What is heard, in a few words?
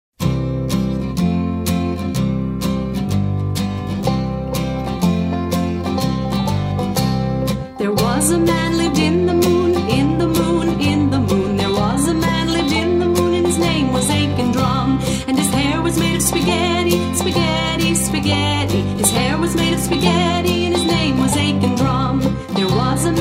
aiken drum